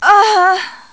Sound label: Ughhh; Riviera